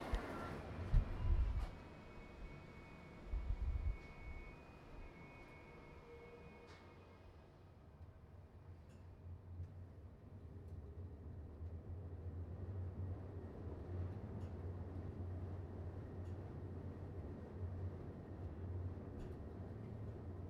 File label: Nyc Subway Subtle Rumble